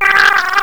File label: gurp; faerie